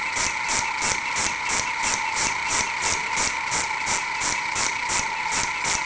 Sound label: swamp; The Elder Scrolls III Morrowind GOTY; XBOX